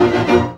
VIOLIN HITS; Best Service; World Colours

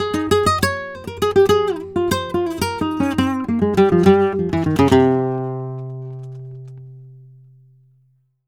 Flamenco Guitar Frase; Best Service ProSamples vol.46 - Flamenco

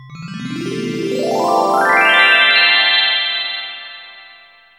chimes up, synth chimes; Zero-G Ambient 1

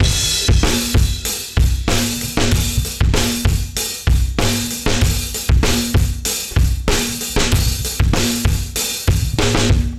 Beat Loops; Zero-G; Monster Beats

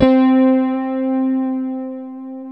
E-RHODES; Best Service Advanced Media Trax Modern Composer

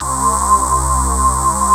VOX PULSER; Pro-Rec - Synthsonic; BASSES

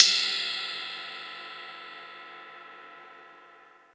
ride, Yamaha TG-500

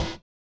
collision; Spectrasonics; Metamorphosis

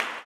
CLAP, Blues Soul; Spectrasonics Vocal Planet

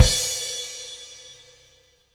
CymKick, Latin Percussion; Sonic Foundry (Sony Creative Software); Joe Vitale Latin Percussion

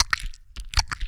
VOC DRUM, MOUTH PERC; Best Service Hyper Dance

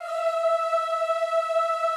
E4 L, Classical Choir; Best Service; Extended Classical Choir

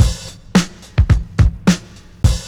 BEAT, Beats 106-111bpm, Hip Hop

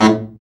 BRA BARI, Bariton Sax

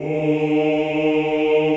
CHO ES2, Ooh

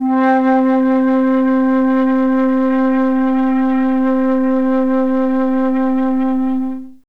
flt C3, Flute, Classic Orchestra; Best Service ProSamples vol.51

